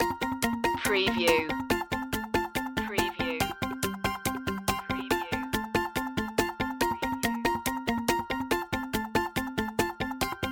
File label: bullet trains loop